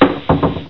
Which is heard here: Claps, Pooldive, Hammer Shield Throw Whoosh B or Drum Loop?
Pooldive